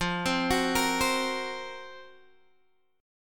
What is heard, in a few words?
Gb Major 7th Flat 5th